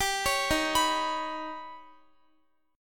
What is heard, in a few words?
D sharp Augmented 7th D sharp Guitar; D#+7 arpeggiated; x,x,17,18,15,18